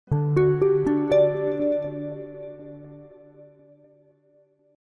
gong

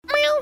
mew

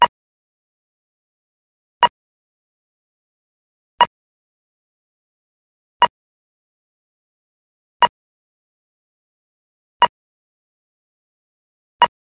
australian pedestrian crossing wait

